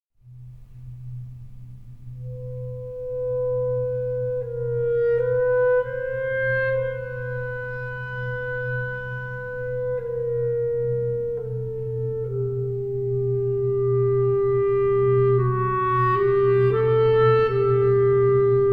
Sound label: Clarinet; Sibelius Symphony